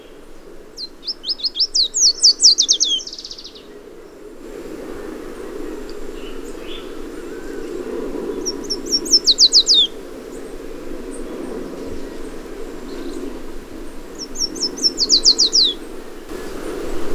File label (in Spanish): canario de mangle, "Yellow Warbler" Dendroica petechia; Los Colores que Cantan